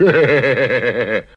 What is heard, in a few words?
laugh satan scratch; ooh ahh